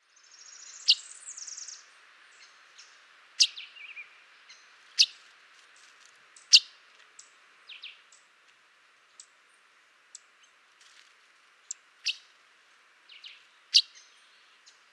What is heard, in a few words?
Red tanager